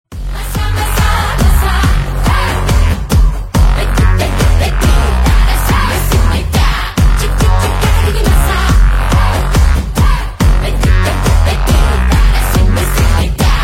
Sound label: BGM Theme Ringtone